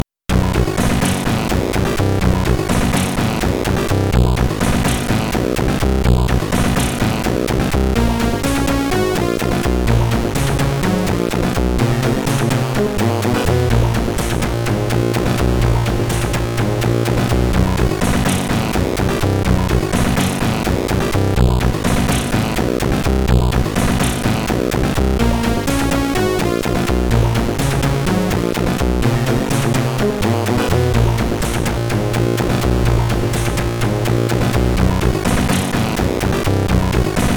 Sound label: Dark Wave Disco